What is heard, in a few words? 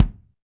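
bass drum, Roland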